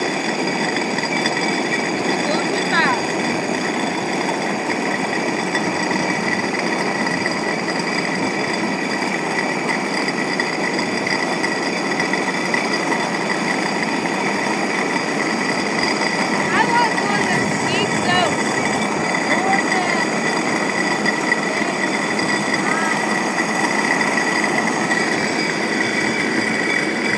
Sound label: Great Orme cable tramway